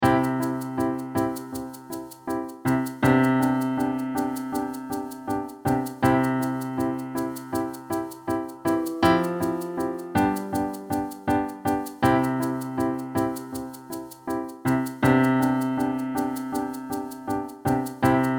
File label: beginner backing track